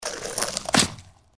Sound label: mantis attack; npc